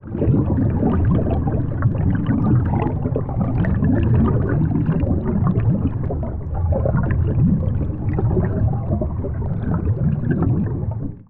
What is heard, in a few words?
underwater